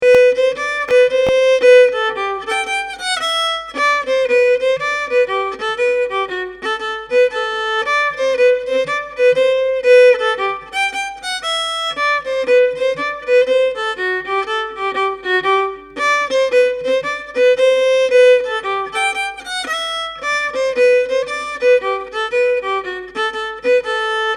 Reel once solo fiddle; Tich's Reel

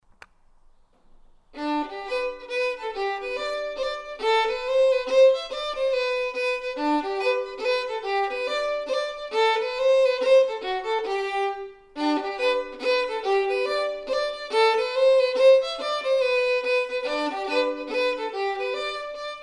Mazurka, fiddle; Sonny Broghan's Mazurka

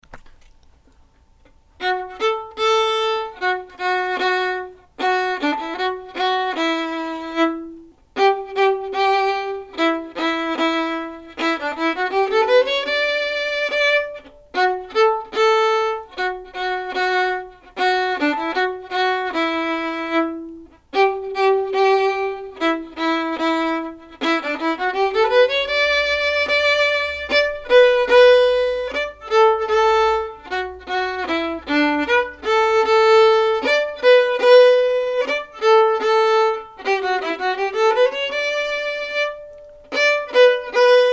tip top polka simplified version, fiddle; Beginners whole tune Recordings

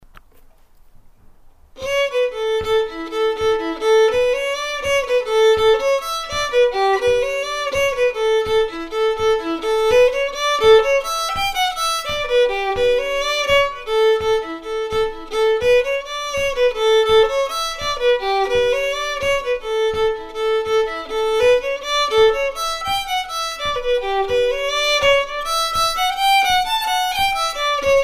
Mooncoin Jig, fiddle

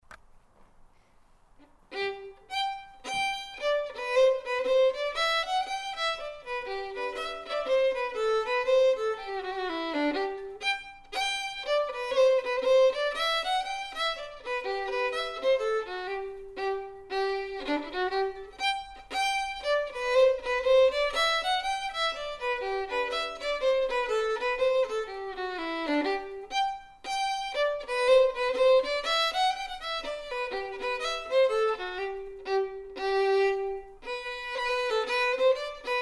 Hornpipe, fiddle; Gloucestershire Hornpipe

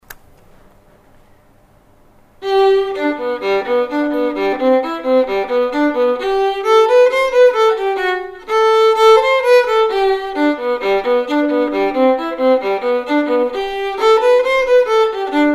old morpeth rant - fiddle

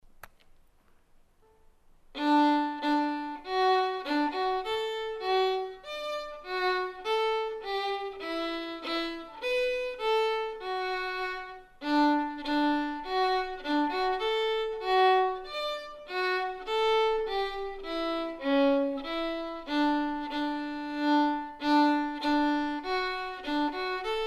Galop, fiddle

